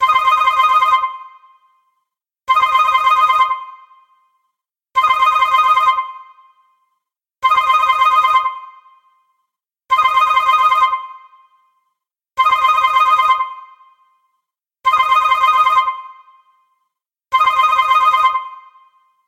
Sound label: Ring Synth; GT540 Optimus; ringtones; LG